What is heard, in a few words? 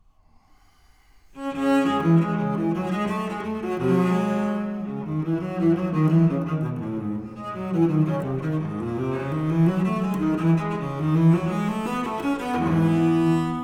Suitte 2 BWV 1008 Courante